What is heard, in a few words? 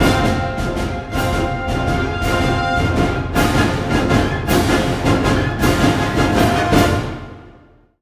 Chase Gameplay Lose Cinematic; Star Trek